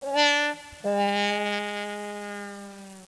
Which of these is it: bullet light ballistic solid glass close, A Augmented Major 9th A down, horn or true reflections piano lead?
horn